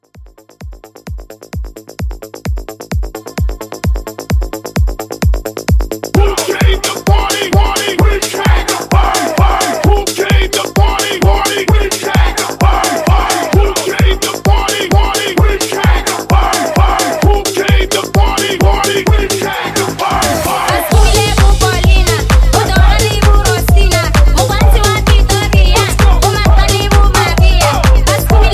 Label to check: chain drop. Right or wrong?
Wrong